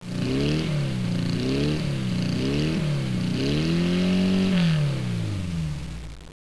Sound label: Porsche